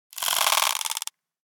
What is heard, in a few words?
Insectoid creature alien attack